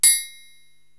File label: Triangle Open